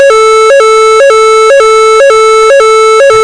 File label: tone